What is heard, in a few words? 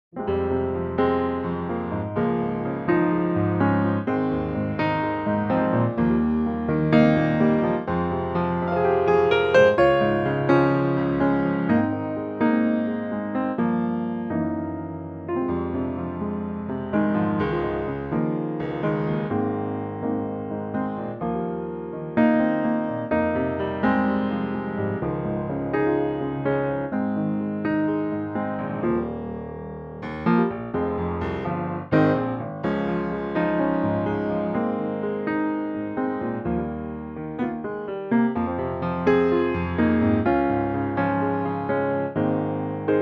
Fm funky PIANO; key - Fm - range - Eb to Ab; slightly funkier feel